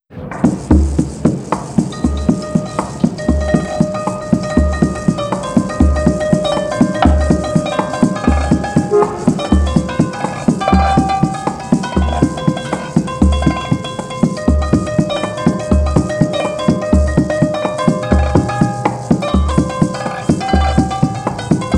Untitled percussion solo in traffic

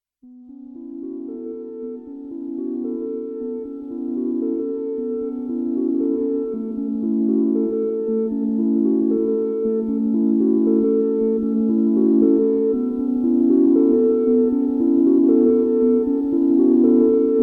Four Synthesizers, Two Bells on Tuned Wood; Hands In The Dark

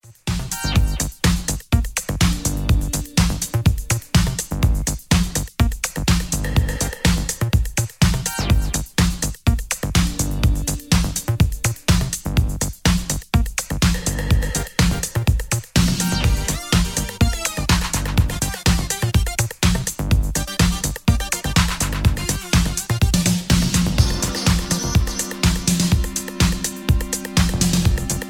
instrumental; usa

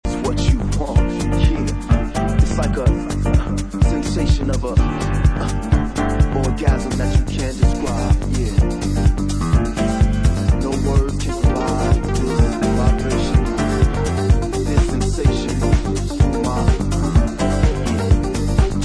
piano mix; house

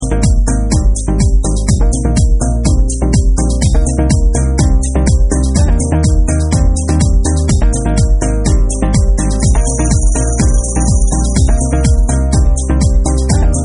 Instrumental; House

